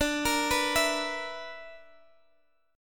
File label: B Minor Major 7th Double Flat 5th, chord